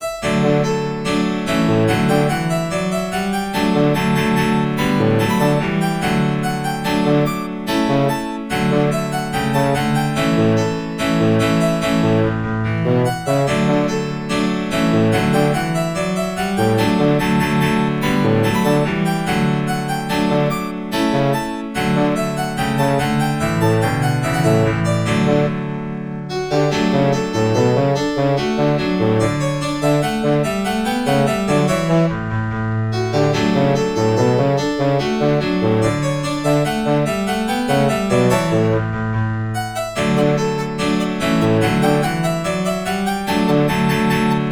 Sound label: Fanfarre; Imagine Wedding Designer; NDS